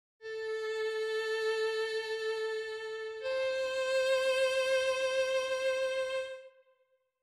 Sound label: Pitch